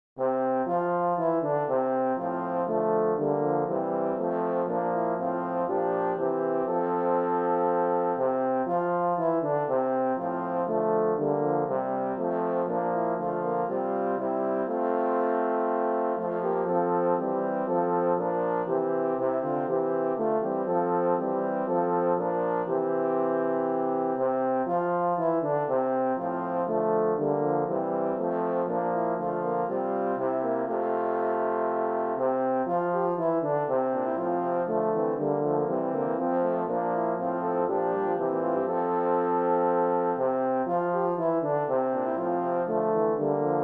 horns

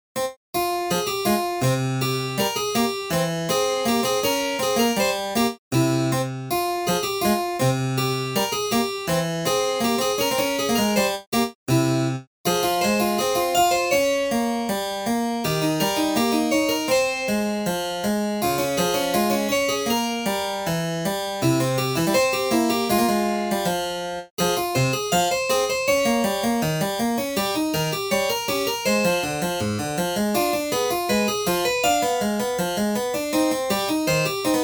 invention in c minor

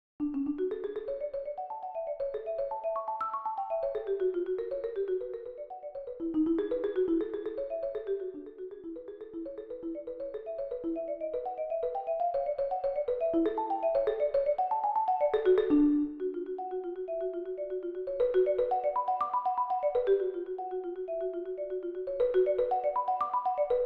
marim

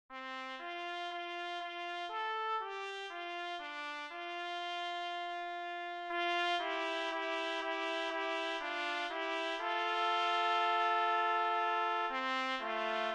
tpt duet

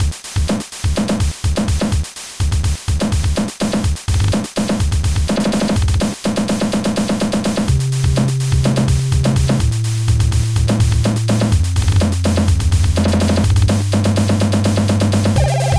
chiptune

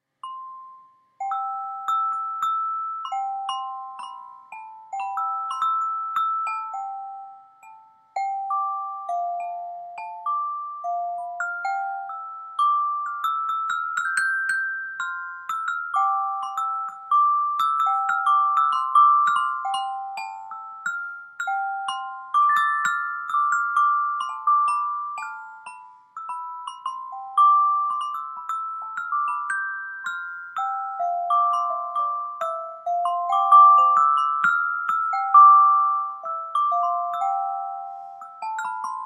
midi toy glock

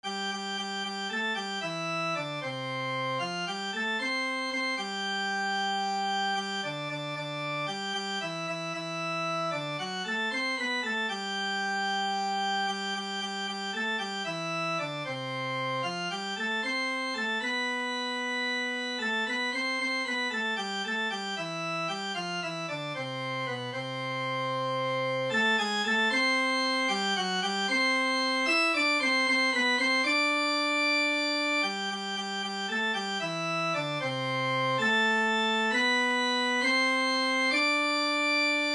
hymn

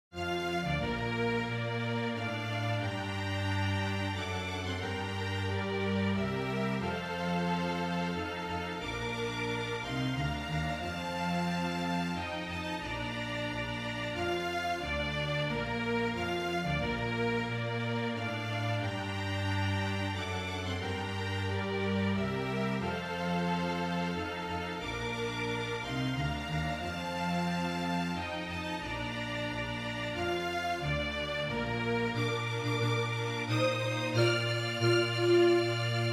orch

